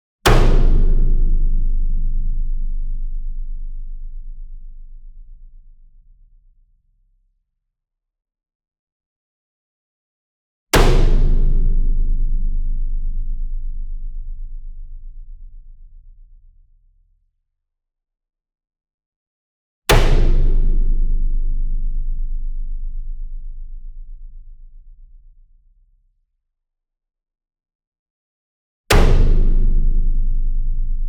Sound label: SINGLE DRUM Bass Drum Concert 40 Inch Hit Whip Beater Medium; boom library; Cinematic Strikes Construction Kit